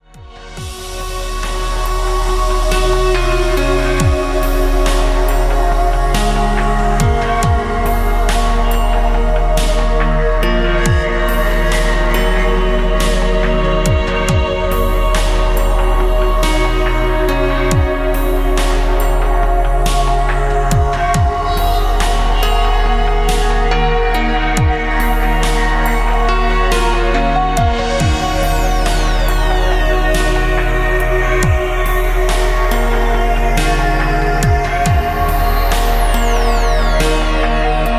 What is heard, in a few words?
instrumental